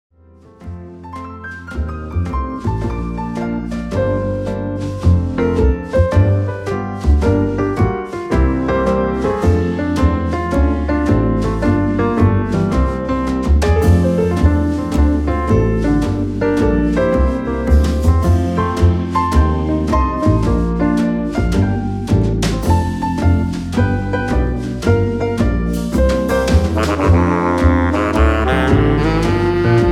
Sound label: bar piano